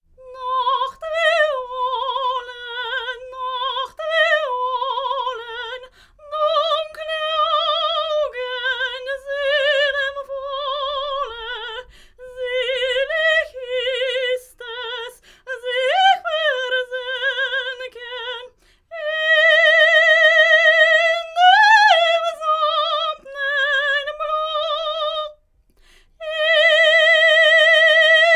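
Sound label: domra, Neumann TLM170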